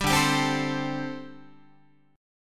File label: D 7th Suspended 4th, down, Guitar